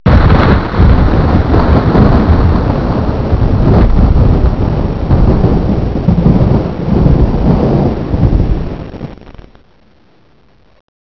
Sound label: thunder